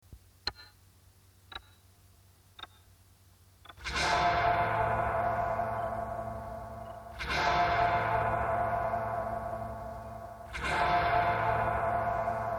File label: this is noise